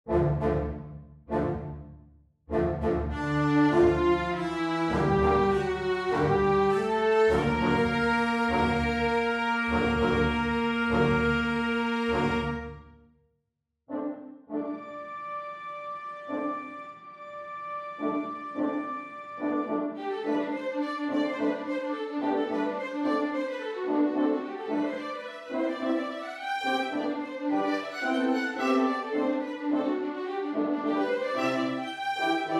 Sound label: Square dance opening